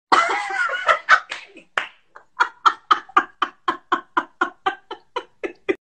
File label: kackle; kamala